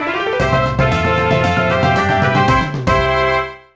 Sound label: Level Clear; Newer Super Mario Bros DS